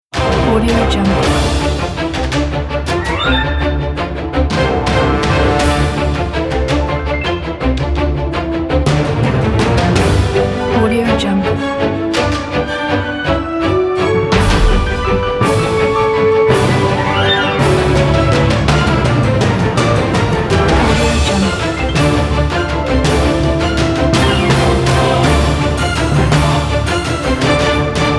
adventure teaser ident

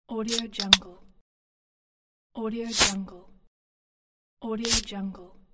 open cap of beer bottle

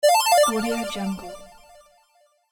futuristic arcade high score

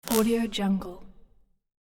mig welder single zap; hollywoodedge

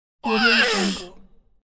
cartoon character pain yell

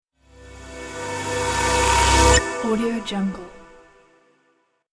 media tech logo

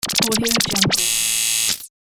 crazy computer glitch

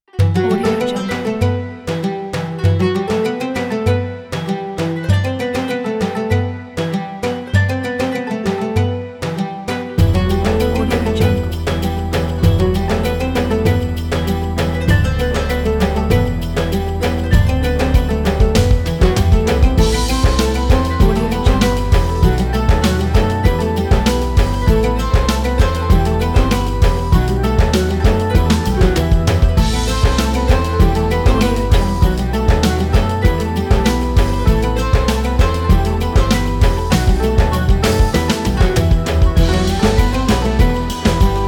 turkish traditional

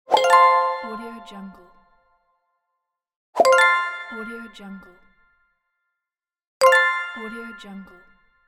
the correct answer

